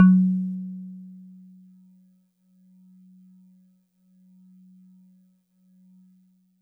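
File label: Celeste DX100 F#1; Pristine Celeste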